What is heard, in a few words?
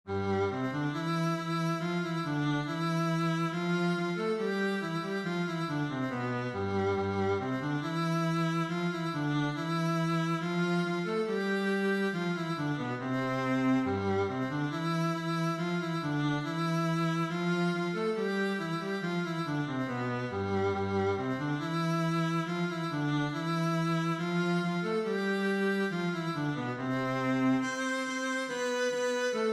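melody in C with viola and cello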